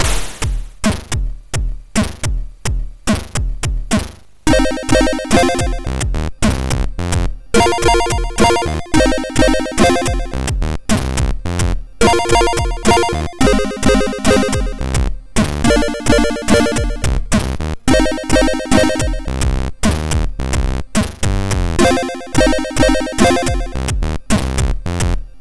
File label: sid MOS6581R3